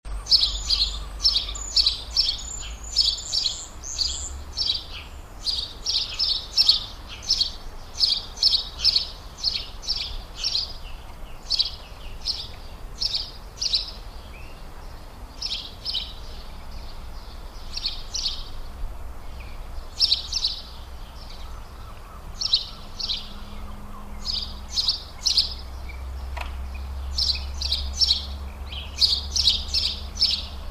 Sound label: Passer domesticus